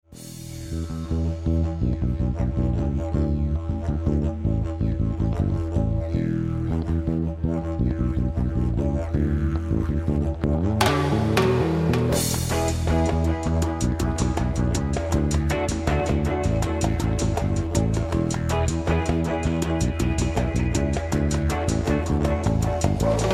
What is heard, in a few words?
Didgeridoo